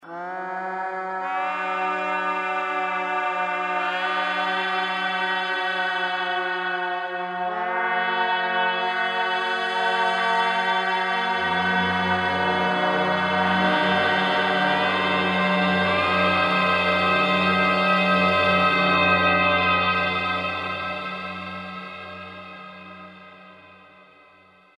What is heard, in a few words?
Tolls, Roland SR-JV80-14 World Collection Asia